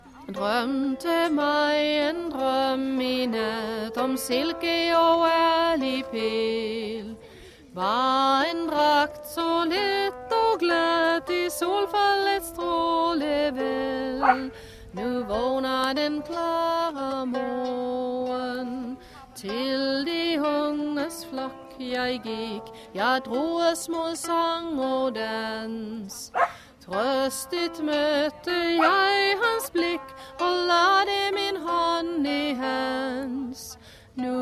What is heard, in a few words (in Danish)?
vikingemusik og sang; vikingemarked